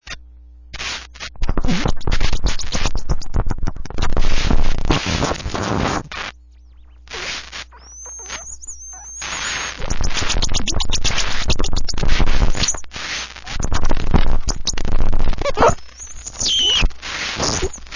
Gunshop

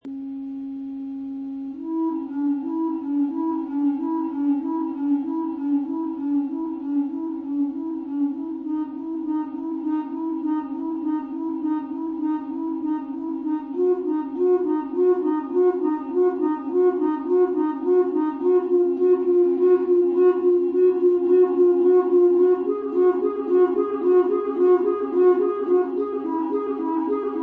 Clarinet

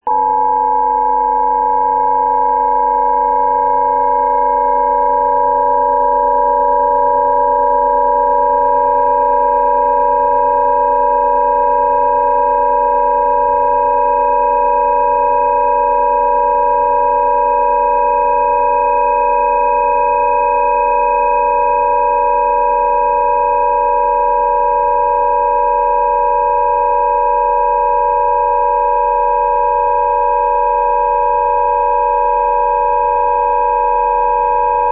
Chase Drums

